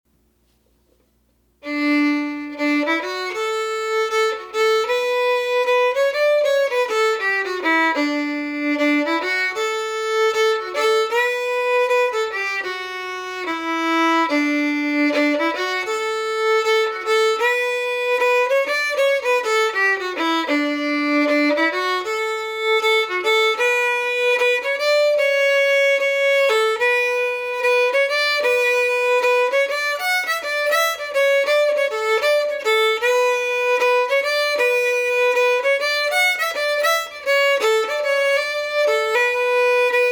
Ice House Maison du Glace medium speed

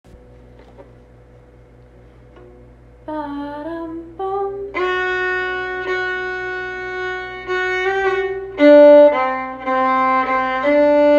Lower harmony

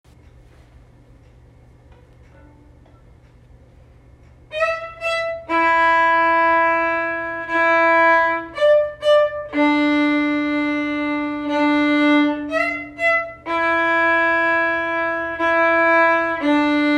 Old Grey Cat simple

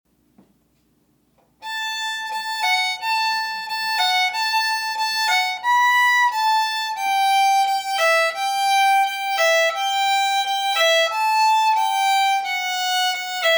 Mississippi Sawyer

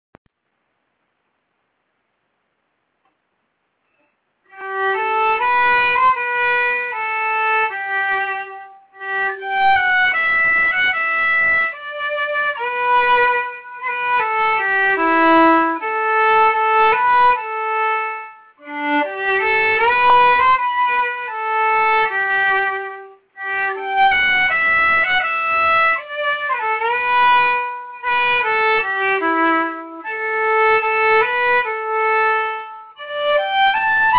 Eleanor Plunkett melody

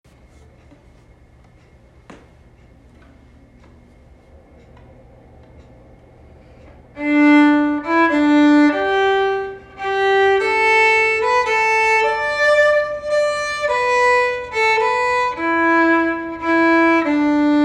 Skye Boat Song

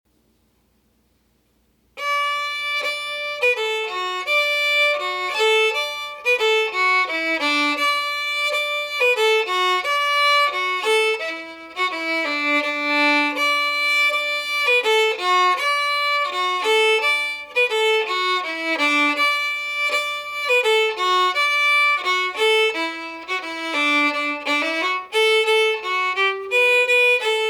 Polka

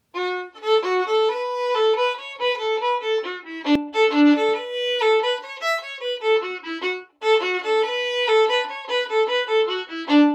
Epic Reel

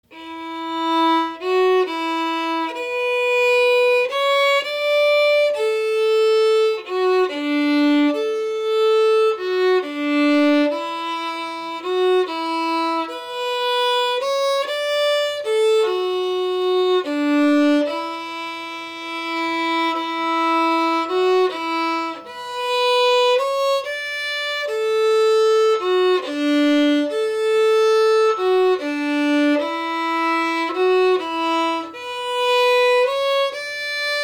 Aaron Boat Song

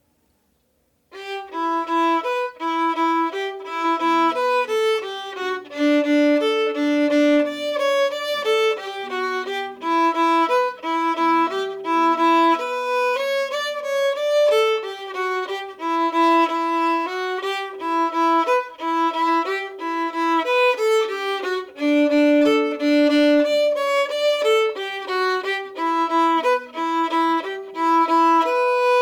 Swallowtail Jig fast

